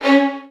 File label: VN FX, Virtuoso Strings